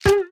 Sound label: hit, thorns; minecraft; enchant